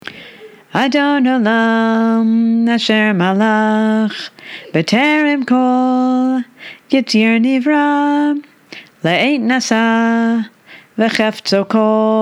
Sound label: Adon Olam chorus